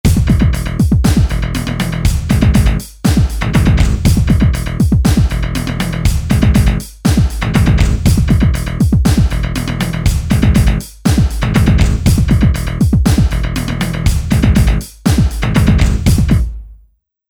Industry

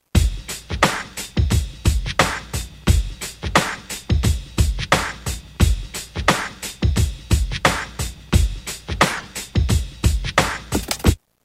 hip hop 88; Roland M-DC1

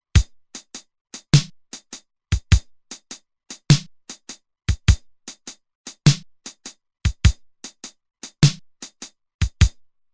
Shuffle, Roland TR-505; Factory Rhythms

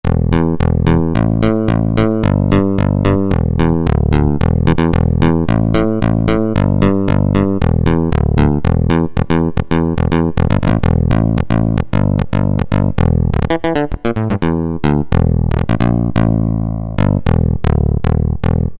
Syn Bass, Roland D-110; Factory Patches

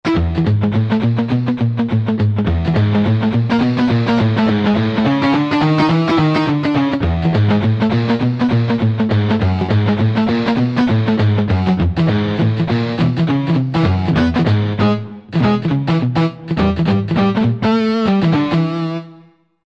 R&R Chunk, Roland JV-2080

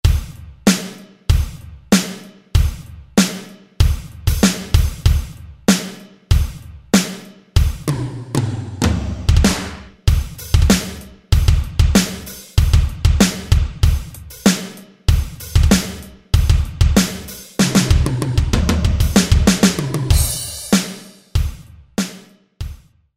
Rock, Alesis SR-16

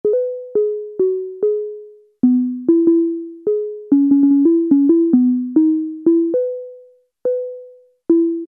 Ring Wood; Alesis airSynth

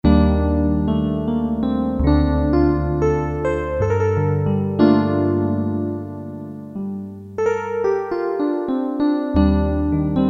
E.Piano, Korg DSS-1 DWGS synthesizer presets; Korg DSS-1 Sound Library

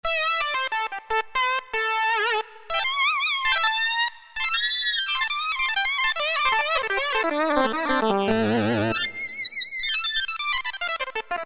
Lead, Roland Alpha Juno-2; Factory B

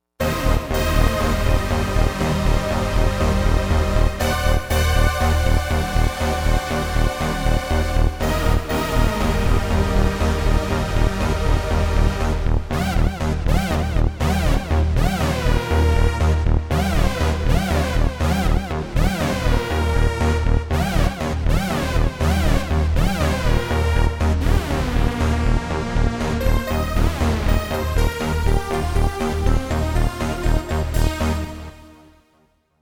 Saws

